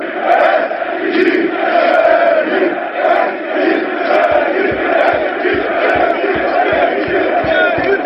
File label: eskisehir, fanchants